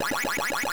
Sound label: power pellet